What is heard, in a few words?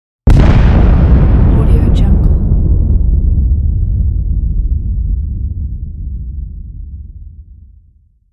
huge explosion; hillmusicmedia